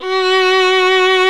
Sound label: SOLO STRINGS